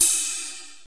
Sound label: Royality free cymbal sound clip tuned to the D note. Loudest frequency: 8825Hz; cymbal sample D key